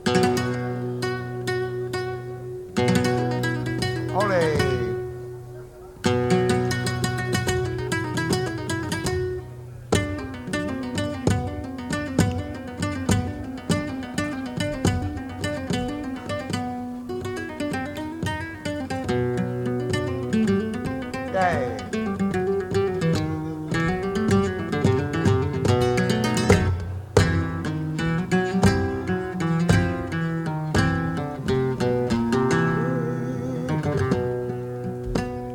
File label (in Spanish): Siguiriya falseta, flamenco